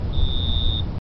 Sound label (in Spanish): la naturaleza